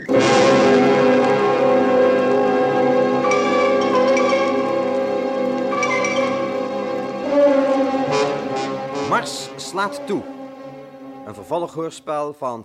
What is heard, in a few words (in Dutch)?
propellor; Sprong